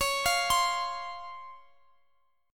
Db Major 7th, Guitar Open D

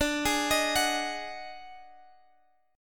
D sharp Minor Major 7th Double Flat 5th D

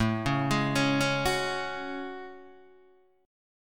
A Major 13th, Guitar DADGAD; chord

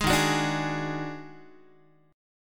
Eb Minor Major 7th Double Flat 5th, Guitar; JGuitar

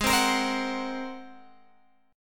Ab 9th Ab down Guitar Open G

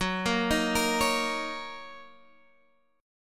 Fsharp 6th down Guitar Standard; F#6; arpeggiated; capo=2

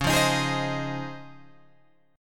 C sharp Major 9th, Guitar, Open G

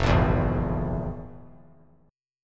F Minor Major 7th Double Flat 5th; FmM7bb5 strummed; JGuitar

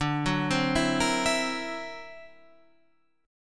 D Augmented 9th, guitar

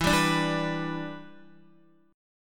E Minor 7th, down, Guitar; strummed

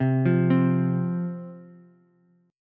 F 5th F, down, Ukulele; F5 arpeggiated

